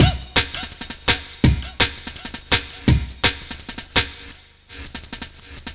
Dispatch Break, 6B, Jungle Breaks; Blu Mar Ten - Jungle Jungle - 1989 to 1999 Samplepack

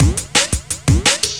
Gene Break, 12A, Jungle Breaks; Blu Mar Ten - Jungle Jungle - 1989 to 1999 Samplepack

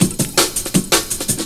Warning Copter; Jungle; Breaks; Blu Mar Ten - Jungle Jungle - 1989 to 1999 Samplepack

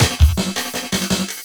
Heli Bongos; Jungle; Breaks; Blu Mar Ten - Jungle Jungle - 1989 to 1999 Samplepack

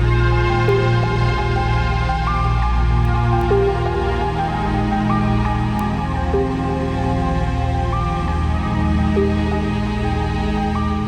Bulldozer Pad, 5B; Jungle; Pads; Blu Mar Ten; Jungle - 1989 to 1999

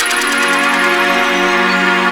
Enchanted Pad; Jungle; Pads; Blu Mar Ten; Jungle - 1989 to 1999

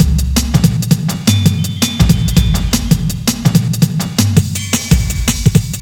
Break; Blu Mar Ten; Jungle Jungle - 1989 to 1999 Samplepack

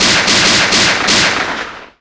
Guns; Jungle; FX; Blu Mar Ten - Jungle Jungle - 1989 to 1999 Samplepack

